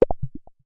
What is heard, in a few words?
interface button, clicks